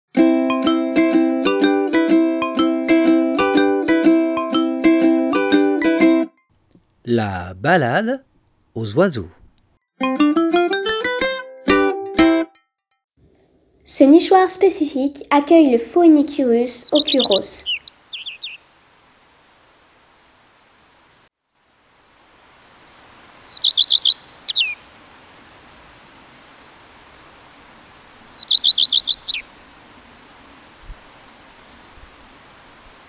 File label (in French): rougequeue; la balade aux oiseaux